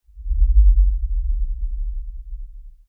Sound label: LFE Tremor, Lfe Tremor Low Bass Shake Rumble; Blastwave; Sound Design